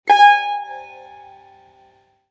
tar la

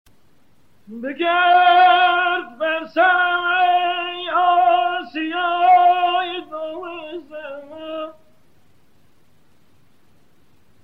hejaz, aboata

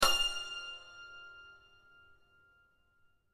santor fa

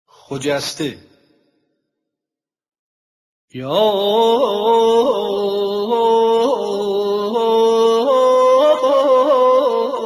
khojasteh, nava

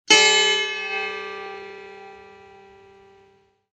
setar la

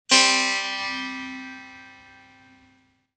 setar do

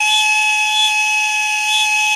ney la

